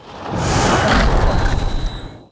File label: Music Frontend